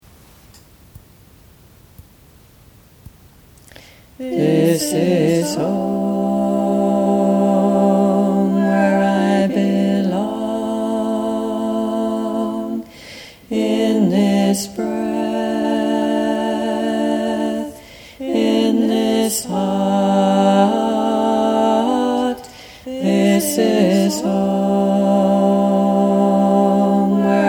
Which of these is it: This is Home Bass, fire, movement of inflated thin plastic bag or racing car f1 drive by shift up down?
This is Home Bass